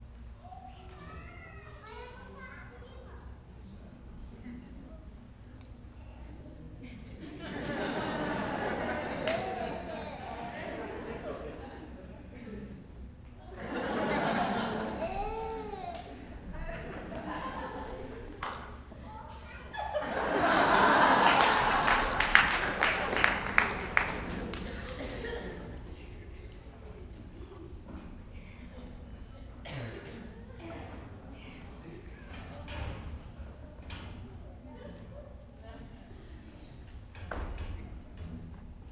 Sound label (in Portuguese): Apresentacao muda plateia e crianca pequena com risadas que crescem, palmas; tosse; multidao